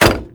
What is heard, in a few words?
Metal Hits; 1944 Battle Of The Bulge